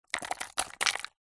foley jewels gems handful place into small container